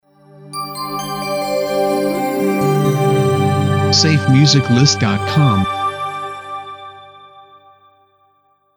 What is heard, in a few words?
Dreamy Video Intro; SafeMusicList